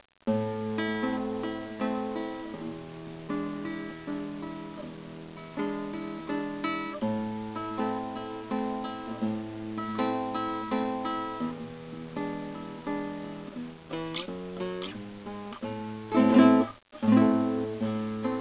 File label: Guitar; Zamba De Vargas